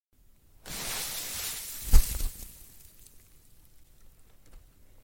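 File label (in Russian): снег осыпается и падает комом с еловой ветки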